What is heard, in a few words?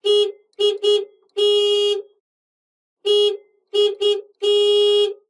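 Vehicle Horn Renault R4 GTL Horn Signal Interior; Mono; Justsoundeffects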